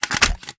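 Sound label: reload fast xmag mag in, shotgun; gmod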